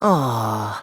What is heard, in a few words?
awww; servant